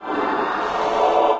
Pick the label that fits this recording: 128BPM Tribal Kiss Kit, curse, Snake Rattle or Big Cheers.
curse